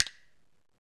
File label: STICKS; Roland - LA Composer vol. 1